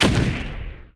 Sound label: bon powerup extra; AssaultCube; Alien Stars